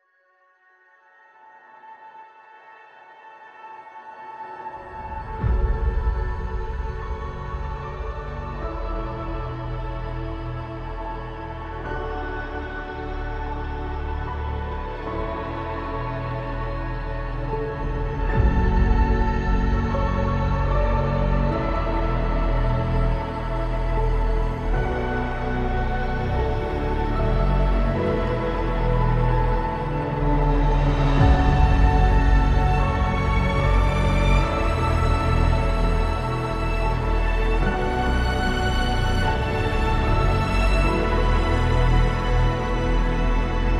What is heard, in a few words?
No Vocals